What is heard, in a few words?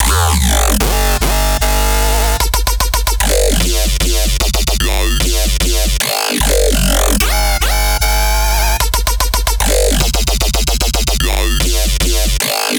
Dubstep Drop Loop 150 BPM G Min, Bass Loops; Synths; Cymatics; Dubstep Starter Pack